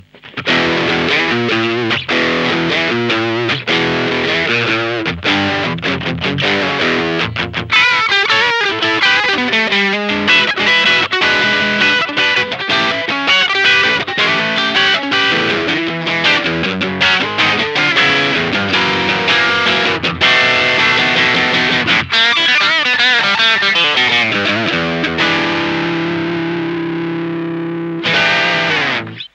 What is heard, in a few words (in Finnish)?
od tele talla